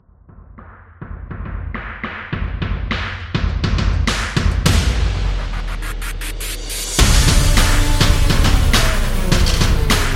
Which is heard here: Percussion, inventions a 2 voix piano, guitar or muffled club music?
Percussion